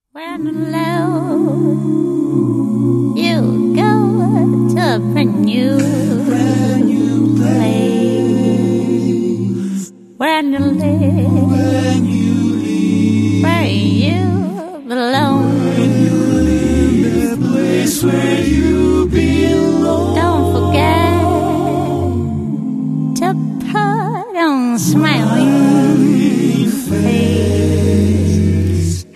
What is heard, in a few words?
acapellissimo